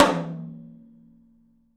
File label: open tom; 3D Sound; 3D Drums; 10 TOM